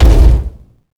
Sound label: step, baron of hell; doom4